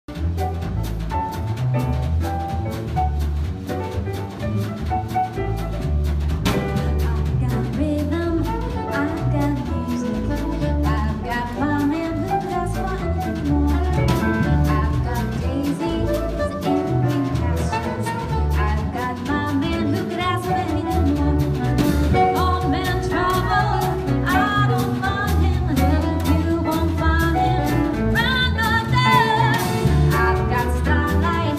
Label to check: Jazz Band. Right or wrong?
Right